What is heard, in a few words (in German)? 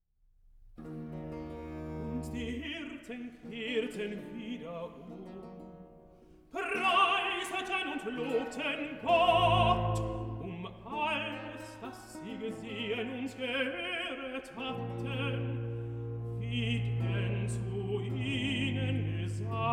Recitative, tenor; Evangelist; Und die Hirten kehren wieder um